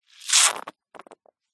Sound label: hospital syringe squirt foamy liquid into container